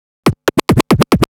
Scratch Fills 140BPM; Scratches; Vengeance - Essential Clubsounds Vol.4

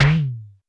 Roland U220 Electric Set, Drum Machines

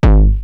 Roland TR-909 BD; BD Selection

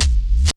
REV KICK

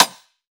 FK SD, FUNK; USB Soundscan vol.47; AKAI; Hip-Hop & R&B

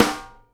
snare GLASS, Live Snares

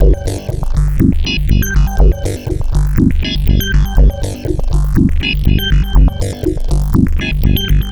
Techno Synth

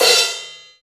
Crash Stop, Cymbals; Roland BK-5

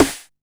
Snare, Drum & Bass

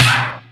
FX snare; E-MU Formula 4000 Series Vol. 2; OrbitPercMaster

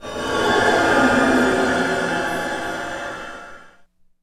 IMPACT SCARY, Cinematic Impact

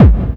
KICK PRO -L, Disco House

